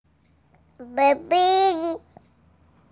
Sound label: Baby